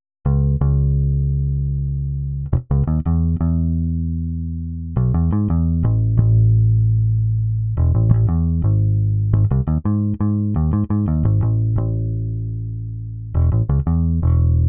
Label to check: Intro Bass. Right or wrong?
Right